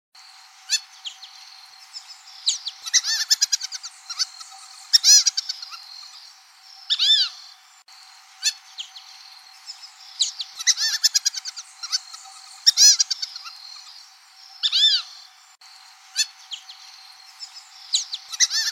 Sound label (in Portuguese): canto da marreca caneleira